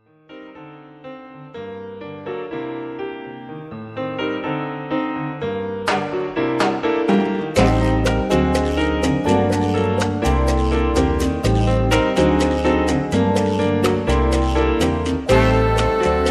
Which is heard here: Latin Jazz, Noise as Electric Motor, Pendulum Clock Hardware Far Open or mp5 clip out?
Latin Jazz